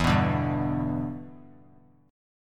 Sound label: E Minor 7th, down; strummed